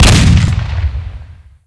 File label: 4 barrel shoot, weapons